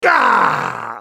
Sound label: dying; ZhaoYunXinZhao; Character Voices - English; en US